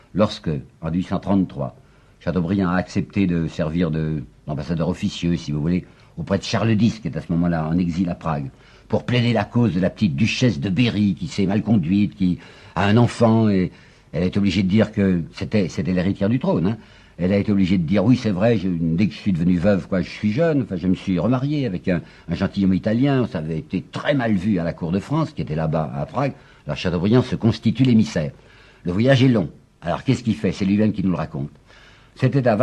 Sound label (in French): accordeon; chateaubriand